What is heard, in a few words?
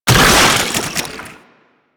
Infested Fishing Fish Kill; Warframe; Infested Fishing Spear